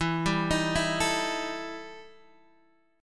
E Minor Major 7th Double Flat 5th, Guitar, Half Step Down